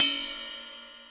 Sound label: Ride; Equipped Music; Loungin House